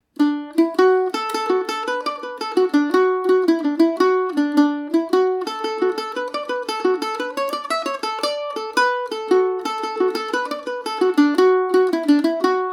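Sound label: D major paris swing, irish mandolin; Paris Swing Macaferri mandolin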